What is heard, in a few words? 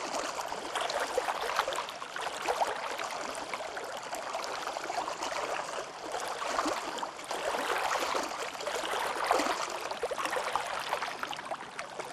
amb dock water; angel city; Titanfall 2